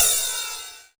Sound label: 909 ride; Goldbaby Tape808 & Tape909; The Tape 909